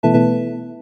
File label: fail; lockpick